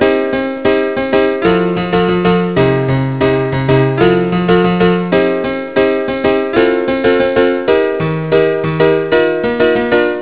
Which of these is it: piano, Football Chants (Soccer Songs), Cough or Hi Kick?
piano